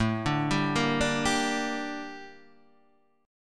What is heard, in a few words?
G Minor A, chord; Gm/A; arpeggiated; JGuitar